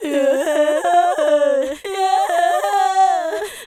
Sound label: Fem Vocal 128bpm G FX, African Voices